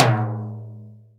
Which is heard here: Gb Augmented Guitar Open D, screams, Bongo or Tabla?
Bongo